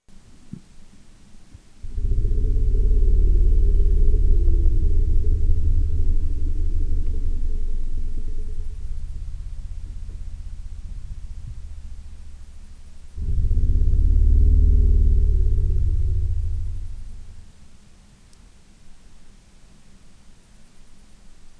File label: male elephant